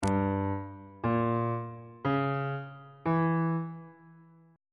g sharp harmonic minor 7th chord 7 is f double sharp diminished 7th on bass clef